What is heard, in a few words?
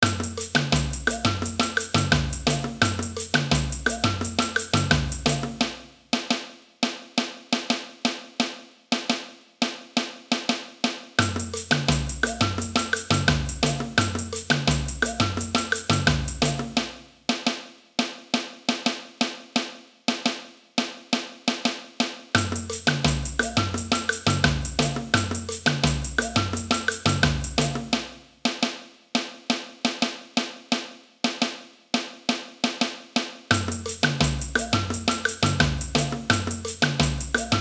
caixa, samba